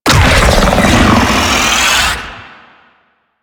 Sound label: Slinky Beam Charge; Warframe; Man In The Wall